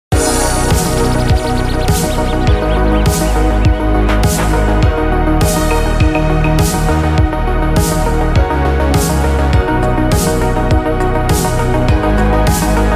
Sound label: Synthwave, Retrowave, Background Music; 80's Vlog